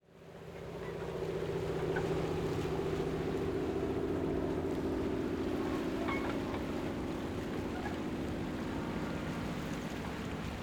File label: Harbour